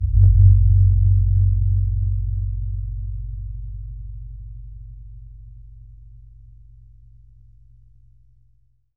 Very deep gong resonance

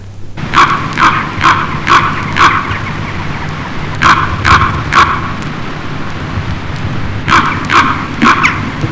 Large bird chirp ambience

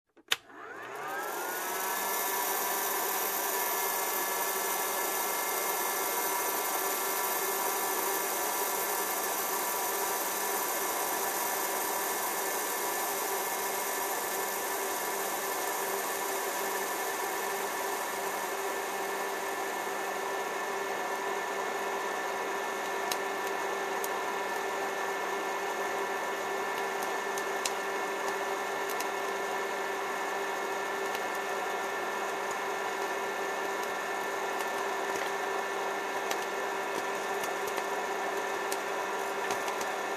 Popcorn in Microwave

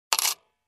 Coin hitting coins

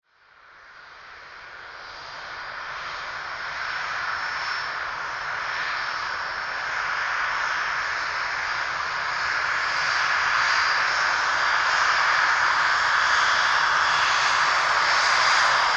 Aircraft Ground Roll

